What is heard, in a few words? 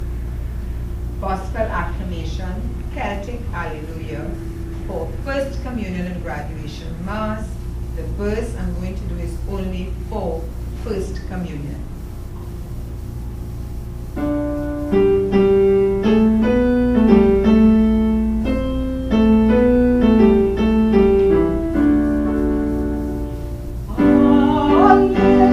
gospel acclamation